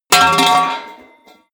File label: pole, hits impacts